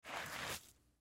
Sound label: Wallet Pick Up, Wallet Leather Pick Up Dirt; Pick Ups; Foley; Objects; Blastwave